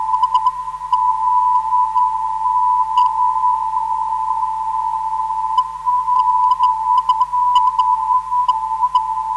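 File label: 370.5kHz, non-directional beacon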